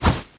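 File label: cutting lightning-fast strike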